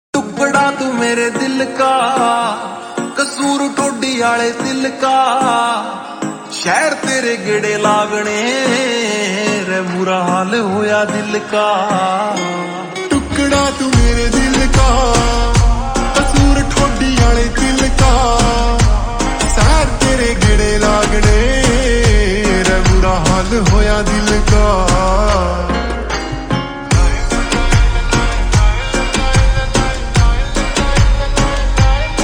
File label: Ringtone Slowed Reverb